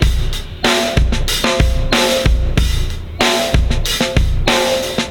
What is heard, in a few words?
Beat Loops; Zero-G - Monster Beats